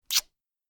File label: kiss